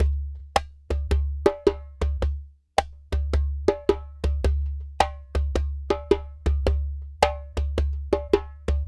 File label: Djembe; Sonic Foundry (Sony Creative Software); Continental Drift Aftershocks